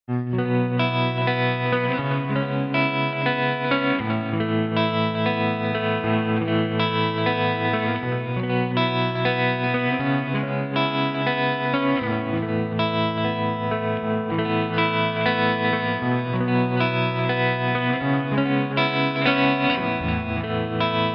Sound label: ballad dry; palmer pdi 03 jb